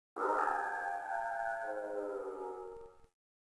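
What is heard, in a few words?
ruins ambience; poolofradiance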